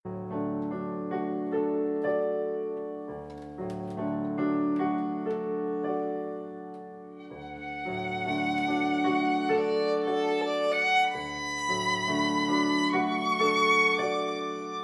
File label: Strings Violin Piano